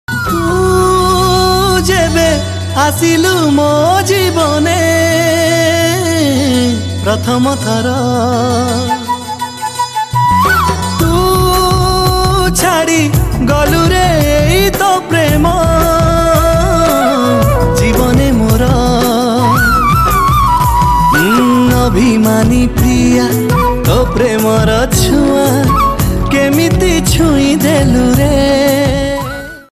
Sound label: New Odia Romantic